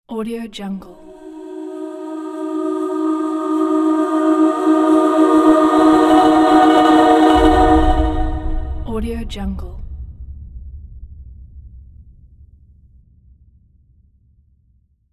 airy choir reveal logo

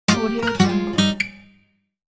short guitar logo

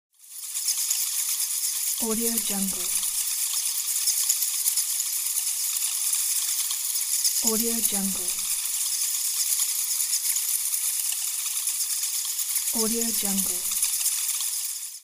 bats

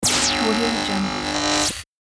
robot scanning

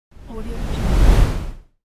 rise whoosh

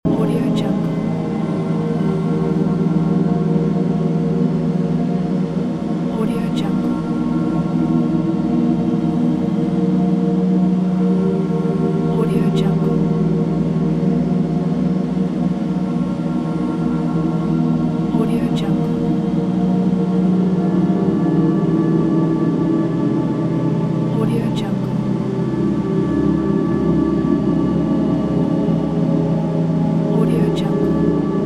dark ambience soundscape

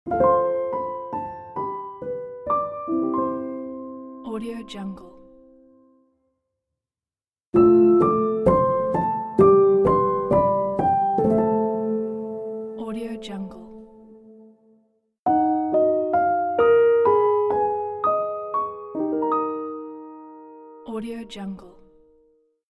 intro logo pack

